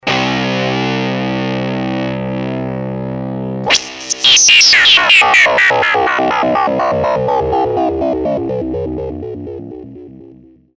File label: rock chord